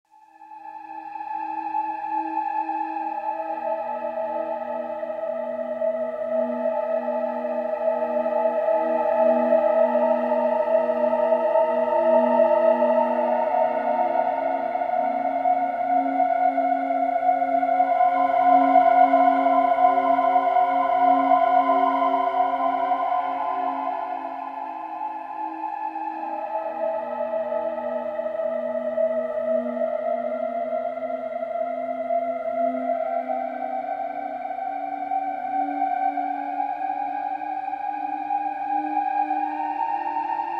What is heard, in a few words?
Ambient Music; Spider-Man Friend or Foe; The Oasis ~Ambient Music A~